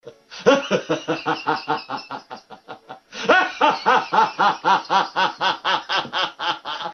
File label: Laughter; meloboom